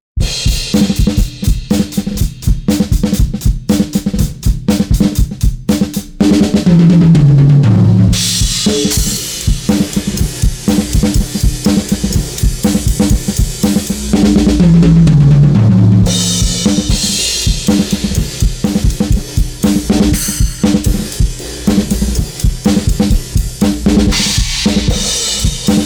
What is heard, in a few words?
EQ low boost BELL